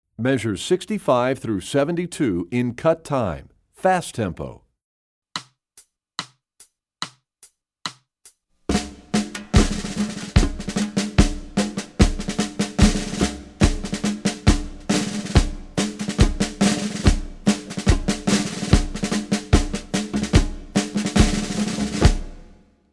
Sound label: Rhythm Studies; EE2000 Book2